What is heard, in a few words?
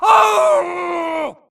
grunt electro; Titanfall 2